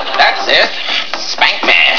spank; firearms

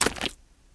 pl mud, player; cstrike

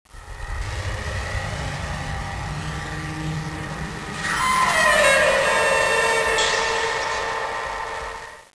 creepy; svencoop

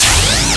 Link Activated; BLinkGunBeam

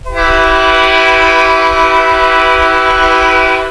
train horn

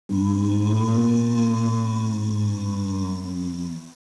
oooo; cstrike

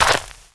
player dirt; svencoop

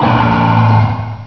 attack; ichy

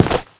player tile

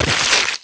claw strike; zombie2

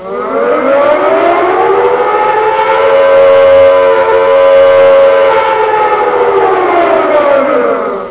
goalbuzzer raid; dod; qorhall

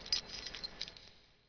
chains

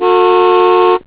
CARHORN; svencoop; amopa

